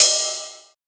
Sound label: Ride, Techno Drum One-Shots; Ghosthack - Free Techno Drum Shots 2024